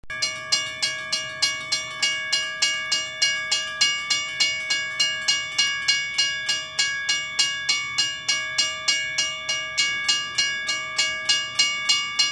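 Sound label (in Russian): zvuki zheleznodorozhnogo pereezda, railway crossing sounds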